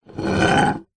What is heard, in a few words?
industrial hammer claw scrape drag garage floor